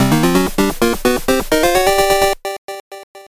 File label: Stage Intro (8-Bit Soundtrack)